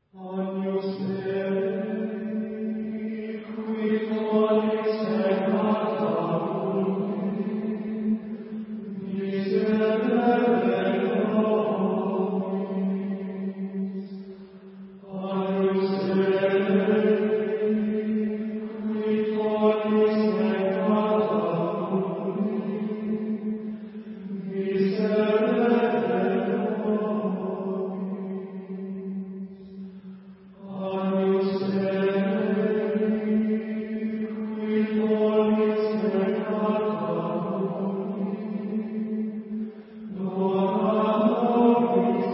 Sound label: Agnus Dei XVIII Gregorian Chants; 古典（格列高利）